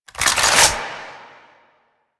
AK47 Reload Mag In; Warframe; Tenno; Weapons

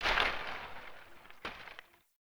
Day Branch Crack Small; Ambience; One Offs; Glades Of Andurin

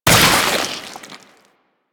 Inf Arm Cannon Mech C; Warframe; Weapons; Infested

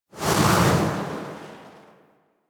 Speed Boost; Warframe; Duviri; Boon Powers

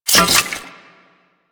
Reload; Kuva Zarr; Cannon

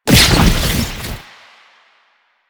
Explosive Impact; Warframe; Akarius Prime

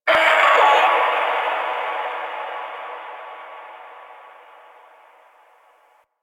Landscape Distant Creatures, Ambience; Zone Two; Warframe; Venus Open World; One Offs

